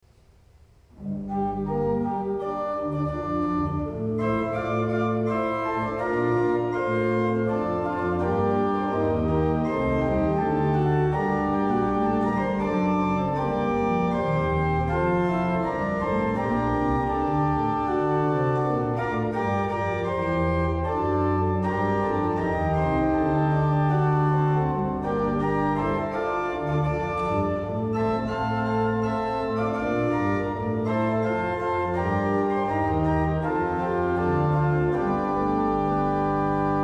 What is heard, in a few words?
Organ; John The Baptist